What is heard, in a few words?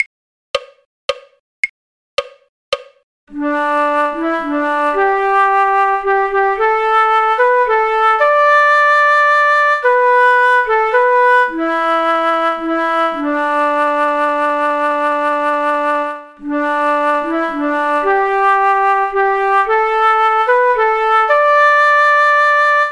flute; skye boat song; folk